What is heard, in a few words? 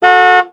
Car Honk; Welcome to the Game II